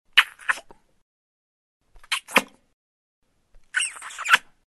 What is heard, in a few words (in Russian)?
pocelui